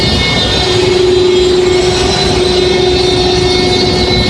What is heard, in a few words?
jet engine; quake2